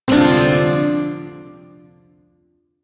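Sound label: Shawzin Major Chord; Warframe; Duviri Shawzin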